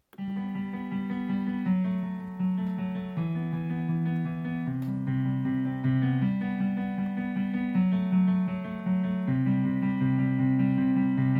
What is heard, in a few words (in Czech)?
Klavir